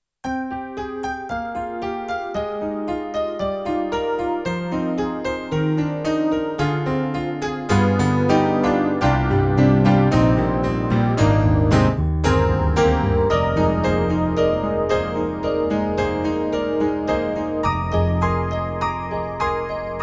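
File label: YAMAHA MOTIF-RACK ES Ballad Key, piano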